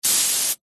garden hose spray water jet on window through fly screen; zapsplat